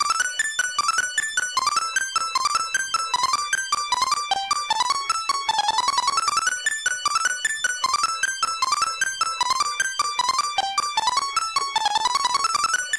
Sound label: Bonus Round; Flicky; Genesis